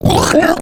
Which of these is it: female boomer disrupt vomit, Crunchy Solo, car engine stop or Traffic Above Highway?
female boomer disrupt vomit